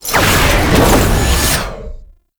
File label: Summon Cast; Roathe; Warframe; Tau; Abilities